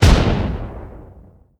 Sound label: Explosion